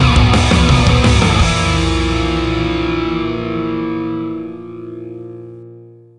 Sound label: Exiting Vehicle Jingle; The Simpsons Hit & Run; PS2; Burning Rubber